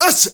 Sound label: male solo, male noise; Heart of Africa; Spectrasonics; Heart of Africa 1